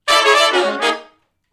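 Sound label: Funk Riff (E) harmony, Horncraft for R&B Sections; Crimson Blue and Fabulous Horncraft 4 RnB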